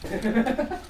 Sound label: laugh